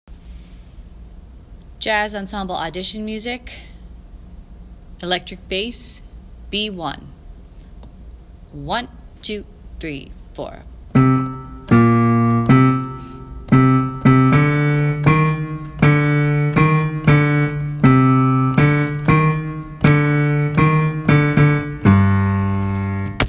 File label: bass